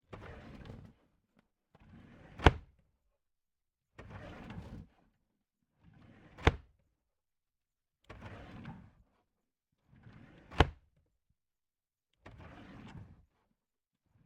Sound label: Filing cabinet, wood, close, fast open and shut, bottom; Tone Manufacture; MicroTone Filing Cabinets